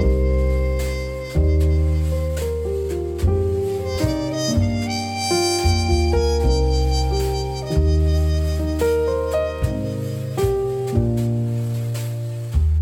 Lounge, Loops

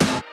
Good Snare; Drums; BB 2HTC Samples Vol 4 Addendum

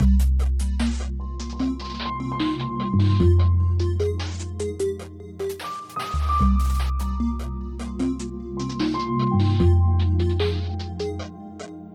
Rainclouds Forming, Loops

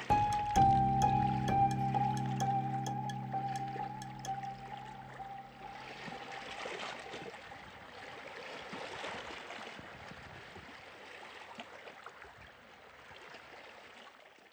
Graceful Fade, Pads

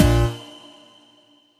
Stab Fade, Pads; BB 2HTC Samples Vol 4 Addendum

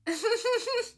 Laugh; stream noises; Micro Pack

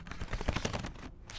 Bumpy Texture; BB Retail Therapy Sample Pack

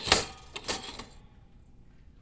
Toaster; BB Retail Therapy Sample Pack

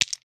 rock falling

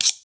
blade; RPG

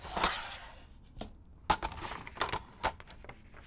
Plaster Clatter; Micro Pack

